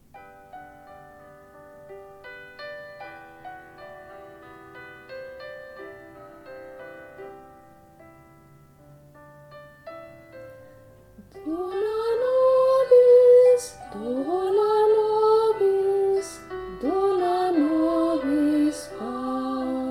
Dona Nobis Pacem, voce 2

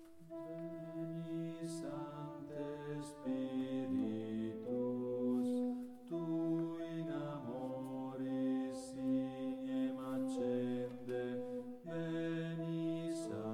Veni Sancte Spiritus, bassi

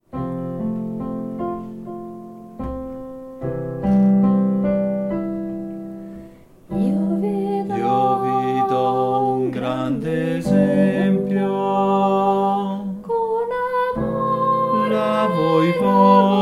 bassi

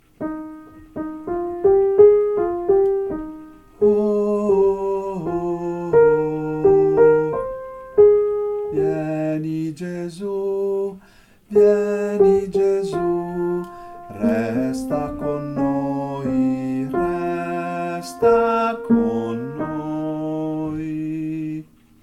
BASSI

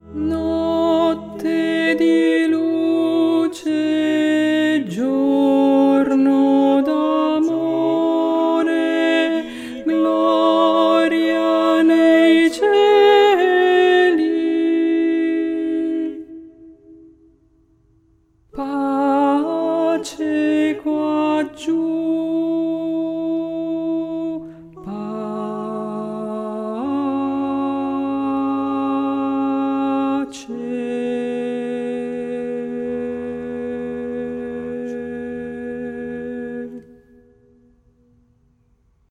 CONTRALTI; natale 2023

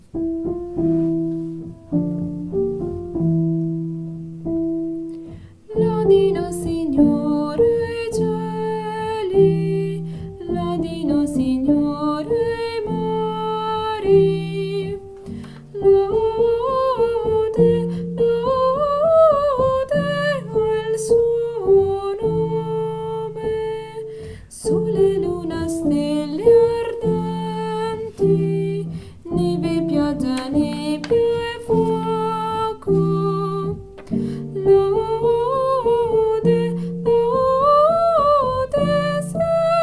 Alleluia Lode Cosmica, solo

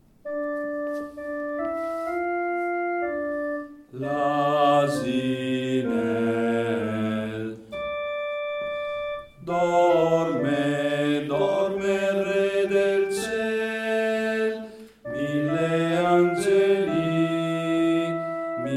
BASSI; Tra il Bue E L'asinello; Avvento e Natale 2017

